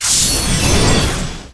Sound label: Explosion Nova; Seer; GamigoNA